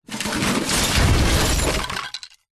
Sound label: impacts junk crash slower glass metal wood small wood debris at end